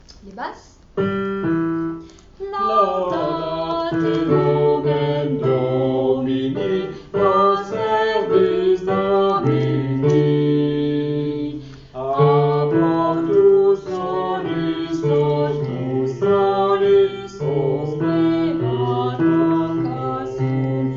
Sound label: basses; laudate